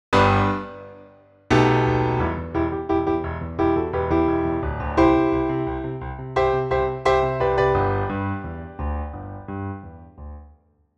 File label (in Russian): RNR рояль заплатка припев